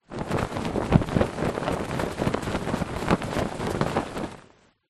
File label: household pillow case shake